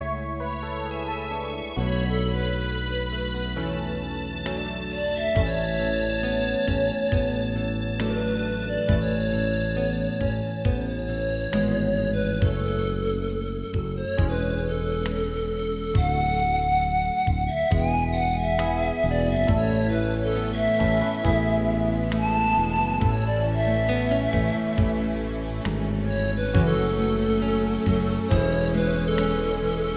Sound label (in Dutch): panfluit